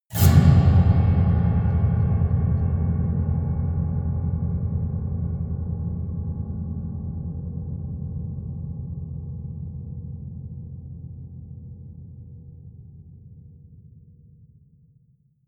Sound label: piano twang multiple fast dull